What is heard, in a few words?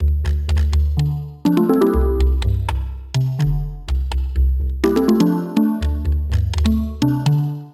Mystic Mushroom D Minor 124 bpm; MIDI Clips; Tonal; Ableton; Factory Packs; Lost and Found